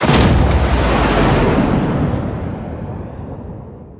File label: scored explosion